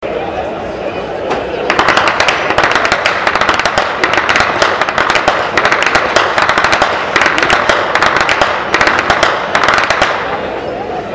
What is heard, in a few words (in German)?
schnalzen; palling